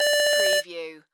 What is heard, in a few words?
Beep Alarm; sound-effects-bible